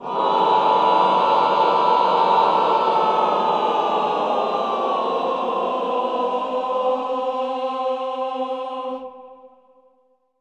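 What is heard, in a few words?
choir cluster; Sound & Vision - Gigapack I CD 2 (Roland); Cluster 1-9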